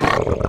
attack

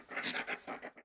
RAT AMBIENT

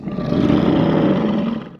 ORC DOG Warn; ALPHA ORC DOG

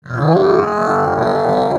WARN

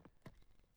Split Wood

